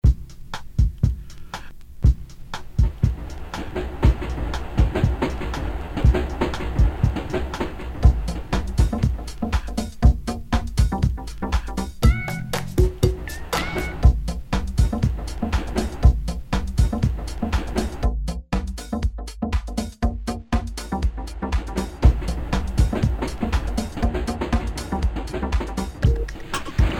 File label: funky train; Pete Ippel; One of the first tracks I ever made late 2000